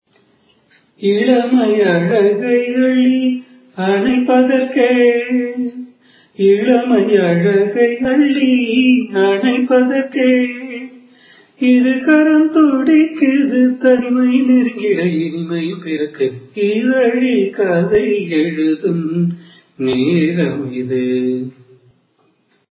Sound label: raga lalitha, carnatic music